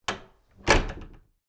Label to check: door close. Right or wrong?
Right